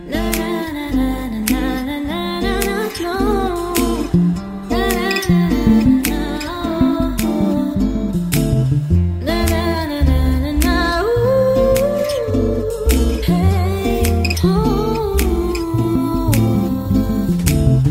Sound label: BG Humming Tone, Telugu